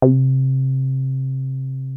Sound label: 303 C3, TB 303; Hollywood Edge; Giorgio Moroder Rare Synthesizer Collection